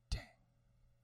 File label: bad dang; angband